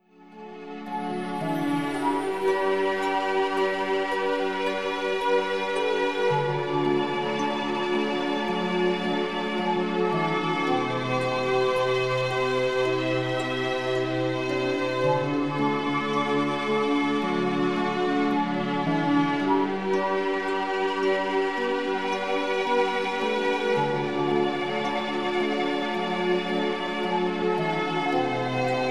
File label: spa music, 432 hz